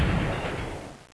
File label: crash